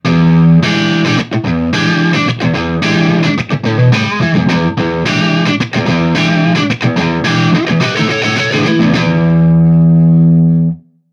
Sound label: Classic Rock, ringtones; Marshall; London KB 1501